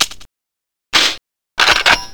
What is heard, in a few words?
reload, weapons; rcp120